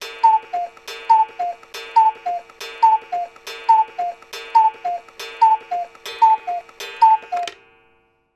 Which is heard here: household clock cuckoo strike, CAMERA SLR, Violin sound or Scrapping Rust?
household clock cuckoo strike